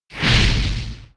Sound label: burst; bullet